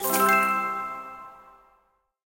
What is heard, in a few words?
wild added; serengeti kings